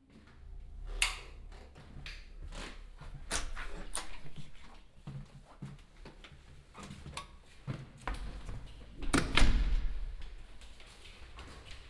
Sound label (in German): hostel rundgang